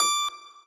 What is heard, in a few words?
lead d